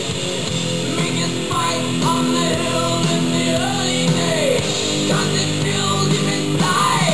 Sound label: bell toll